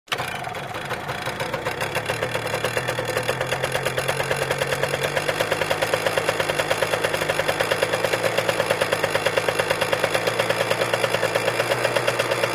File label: Motorcycle starts, waits, drives away